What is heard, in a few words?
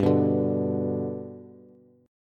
G Major down Bass; G strummed; JGuitar